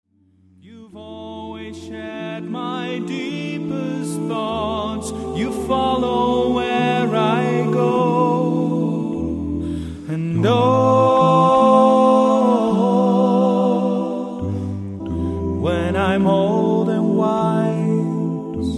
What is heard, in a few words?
satb